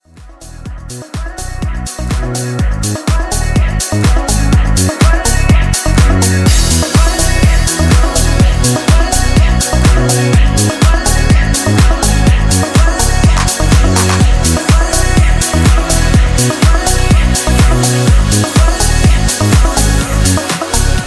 Future House Intro; Remixfreakz

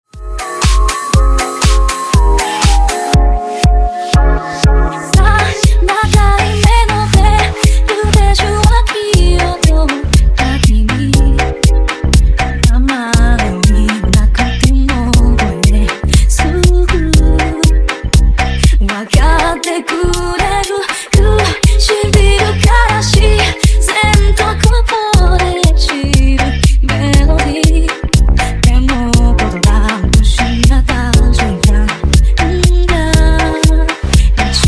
Funk NU House 120Bpm

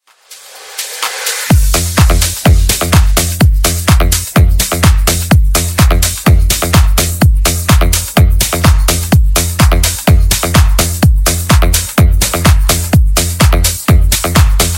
Funk 126 Bpm

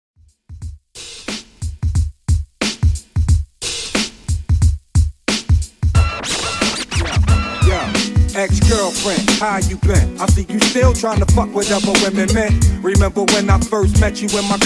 Old School Redrum 90Bpm

